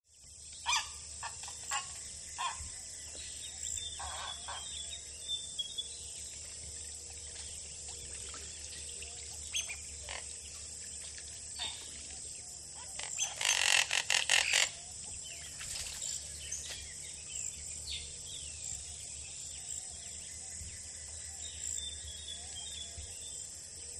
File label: Birds Clucking In A Pond With Backgro; Birds Clucking In A Pond With Background Bird Calls And Insect Bed, Close Point of View.